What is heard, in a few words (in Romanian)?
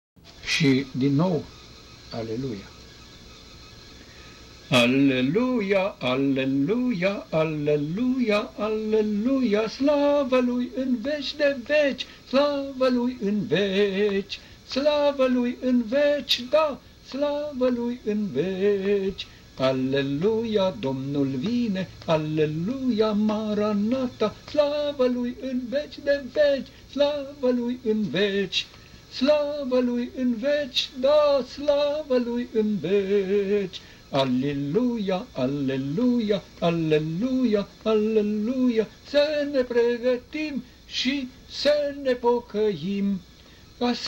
Aleluia, Aleluia, Aleluia, Aleluia